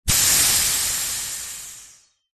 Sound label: foley air puncture air release hiss; zapsplat